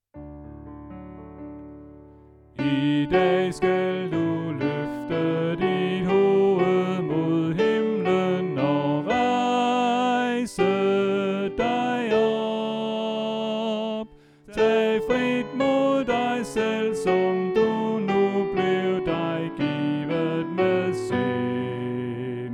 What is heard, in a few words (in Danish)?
Sopran; oevefiler